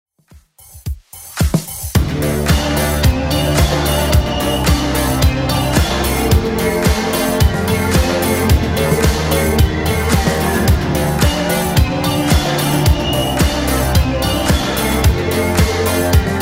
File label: 80s Club 110 bpm